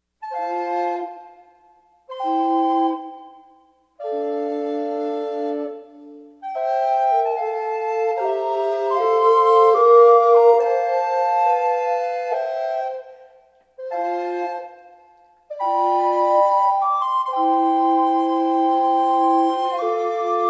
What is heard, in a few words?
quartet; O Canada